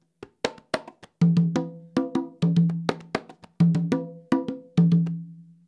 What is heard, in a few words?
guanganco, congas